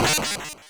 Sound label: break; deltarune yellow translation